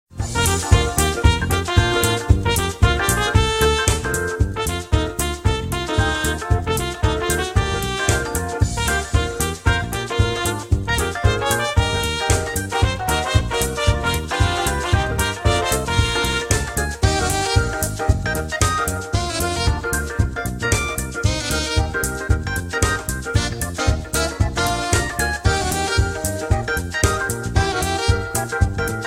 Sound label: Jazzy Overworld; SM3DL